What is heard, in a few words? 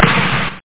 bang